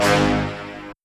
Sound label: soft hitwhistle; osu!